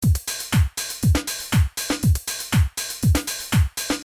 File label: house